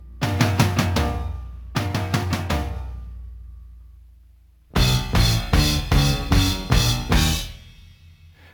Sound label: Piano and drums only